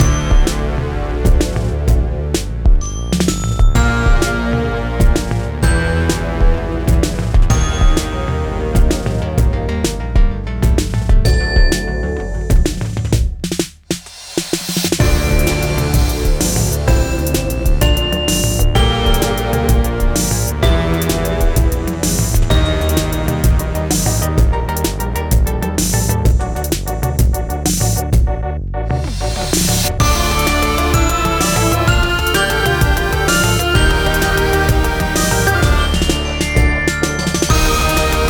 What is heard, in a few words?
(Combat) [Glockenspiel Ver.]; Temple of Storms; Cadence of Hyrule